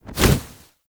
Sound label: Bird Of Prey Wing Flap Down; Characters; Warframe